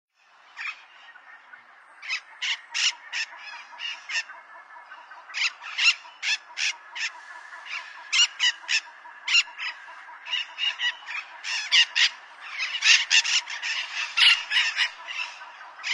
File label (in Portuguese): canto do periquito de bico fino